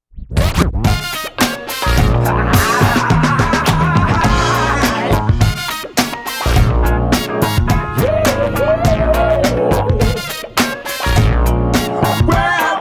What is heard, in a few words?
Funk Breaks